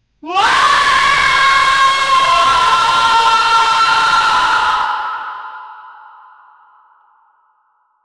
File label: suffering and pain scream